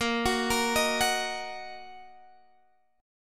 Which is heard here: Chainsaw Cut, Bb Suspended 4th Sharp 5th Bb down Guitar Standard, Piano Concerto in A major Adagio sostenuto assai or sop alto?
Bb Suspended 4th Sharp 5th Bb down Guitar Standard